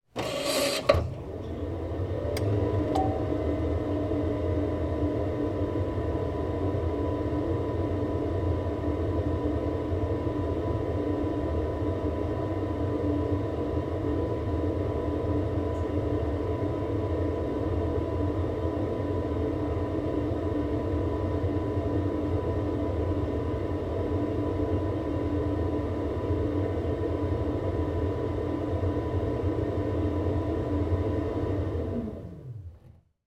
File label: household combi boiler on run off underneath